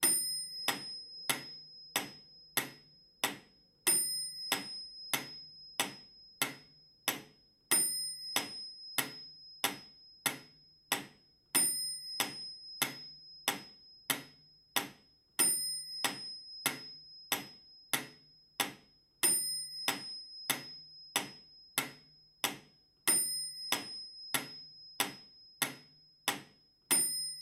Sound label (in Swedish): metronom